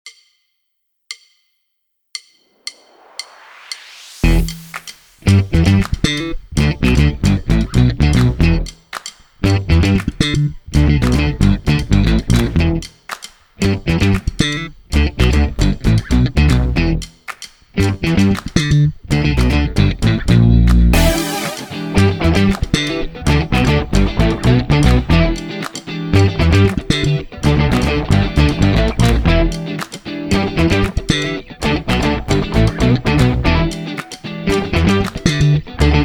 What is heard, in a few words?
drumless